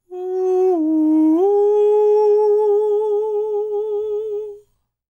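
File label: falsetto, Vocal Planet Jazz FX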